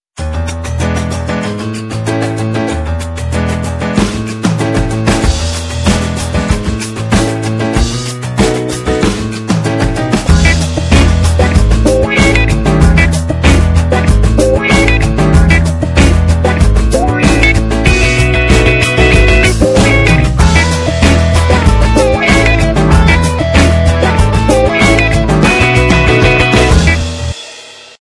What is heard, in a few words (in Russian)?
modem music; MegaFon